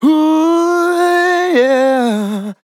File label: man disco vocal fills 120BPM Fm